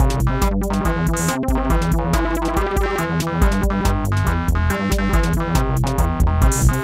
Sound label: Electro